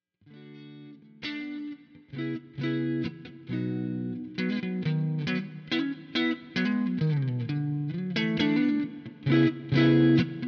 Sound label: strat stock marshall; UAD-marshall-sl